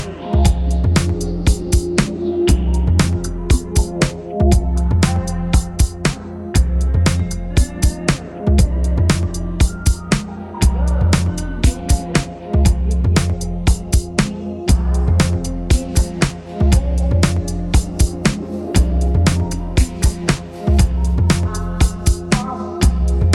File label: Blues